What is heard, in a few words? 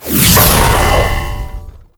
Stalker Assassin Dash; Warframe